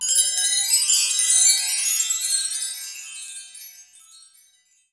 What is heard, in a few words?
Orch perc; musicradar; cartoon caper